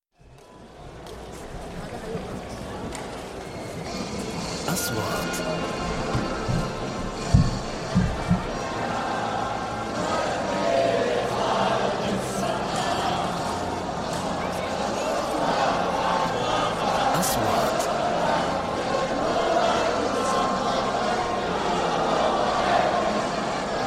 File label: National Anthem Football Stadium